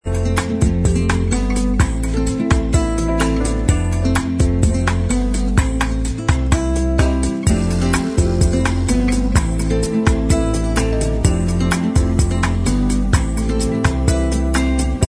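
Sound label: bossa mix instrumental track, backing tracks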